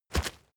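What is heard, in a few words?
step leaves wet heavy, footsteps; schritte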